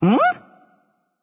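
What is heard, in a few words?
confused, female; smite; voicepack; hera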